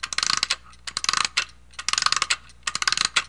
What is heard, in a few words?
Clockwork Spring, notifications; Huawei C8813D